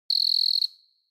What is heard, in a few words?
cricket chirp